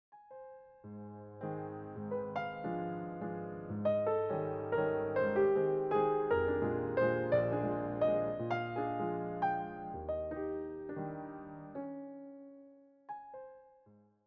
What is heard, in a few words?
Valse allemande, piano; Carnaval, Op. 9; schumann